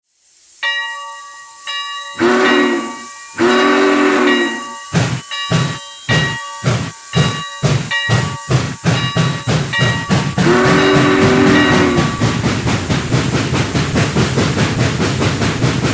Heavy Steam; RailPro

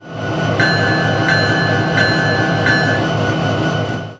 Bell Electric; RailPro

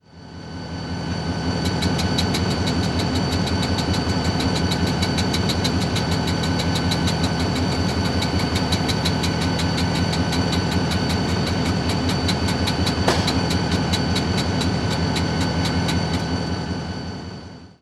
Brake Automatic Set; RailPro

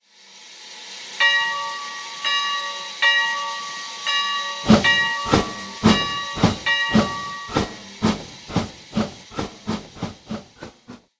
Bell Steam; RailPro

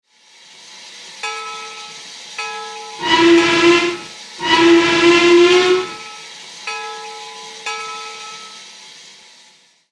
Hooter; NW Hooter; RailPro

